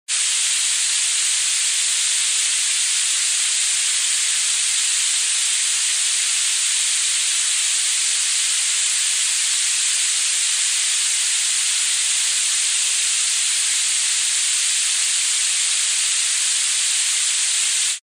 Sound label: White noise; radio signals